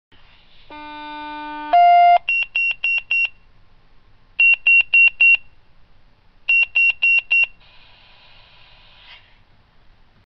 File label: Tone D, Watchdog Alert; OSI